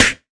snare, Hardcore Breakbeat; Big Fish Audio; Hardcore Breakbeat Action; Riddim

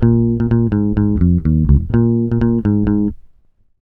DISCO 126 A, Bass Grooves; USB Soundscan vol.30; AKAI

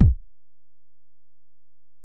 Ac BD, Alesis DM-PRO; 300 Drum Machines

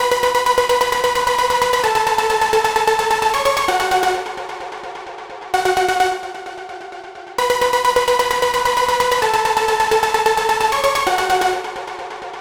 Trance Lead, Big House; Sonic Foundry (Sony Creative Software); Afterhours EDM

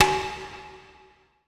E TAB SLP B; ETH DRUMS

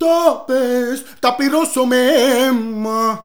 SINGER; Best Service; Ethnic Journey Sound Cube

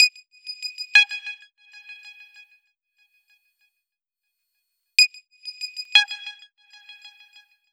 SYNT, funk

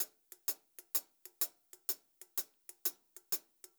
126 CL HH L, Pop-Rock Drumloops; USB Soundscan vol.37; AKAI; 90 s Sample CDs

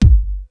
KICK, Hip Hop

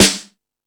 Dance 2000, Drum Machines E-MU Pro-Cussion

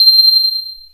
DIGITAL SEQ, AX-60; Rubber Chicken Software Sample Bank

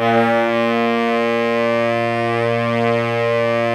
TENOR SAX -L; Giga Samples Collection; SAX STAX

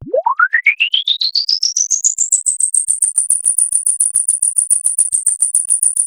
NL FX MIX; Masterbits; Vintage Voltage; SQ+FX

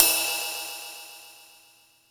Ride Cymbal, Yamaha PSR E305

